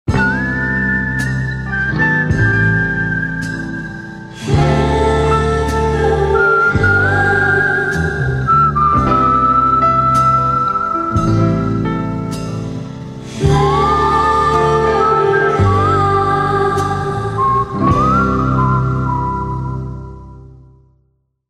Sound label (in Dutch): fluit; jingles; veronica